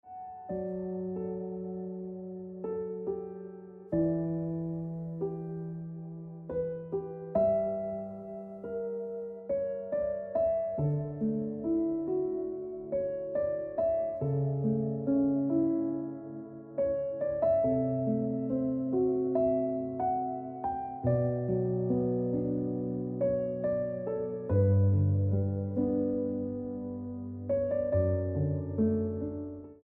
Piano Music

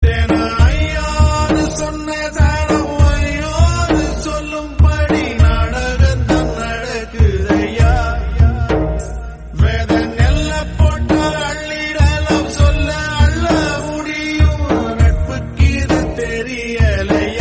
Tamil Song

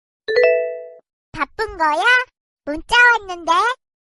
Funny Korean Whatsapp Notification

